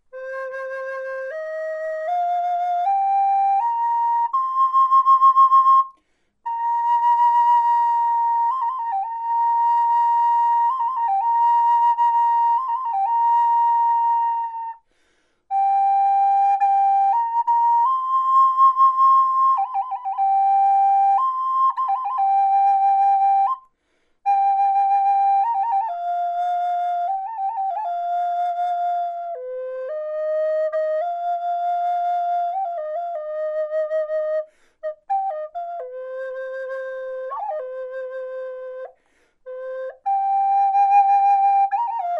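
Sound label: natural, wood flute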